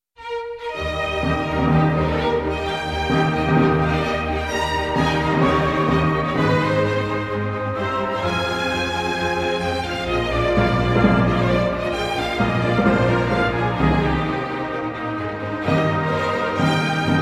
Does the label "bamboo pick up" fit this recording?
No